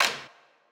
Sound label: Clap, Drum Percussion Single Hits